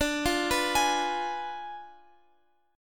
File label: D Diminished 7th D, Guitar, Open F; Ddim7 arpeggiated; x,x,14,12,14,15